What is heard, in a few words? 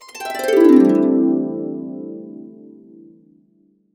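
C3, Complete Harps; USB Soundscan vol.62; AKAI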